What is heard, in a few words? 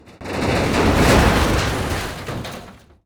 explosive objects; World of Tanks